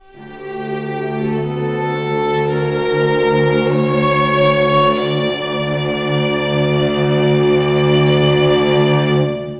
Chamber D min, Strings; Zero-G; Total Drum Bass

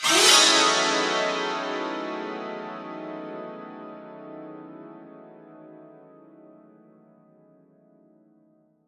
santoor fx; World Instruments 2; STRINGED INSTRUMENTS; PS SANTOOR SABERI

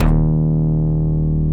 SMACK BASS; BASS SOUNDS

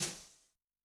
Br Sw Time, Snare; Yamaha DTXpress-II

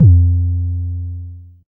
808 F2, Kicks; Drums; MBase 11 - 808

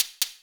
909 St. Claps; EMU Orbit-3

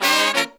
132, Pop & Funk Brass

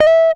GTR TRIK, Jazzistic; Univers Sons

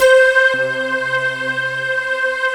VP1, PAD; Alpine Volksmusik

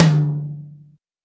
TOM, Drums; Best Service; Real Mega Drums VOL-1

